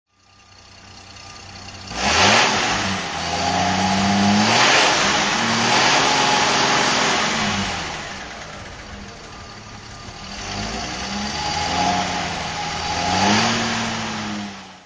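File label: Bentley continental r throttle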